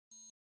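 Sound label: Flash Beep Electronic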